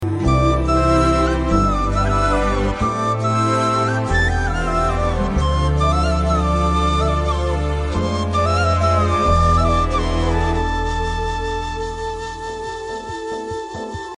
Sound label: flute tone